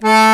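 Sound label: bandoneon A2, accordion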